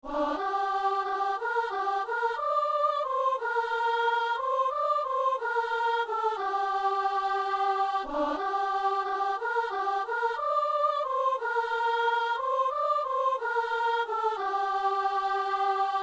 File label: soprano